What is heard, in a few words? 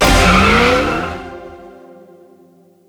wild Land